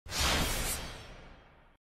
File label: open menu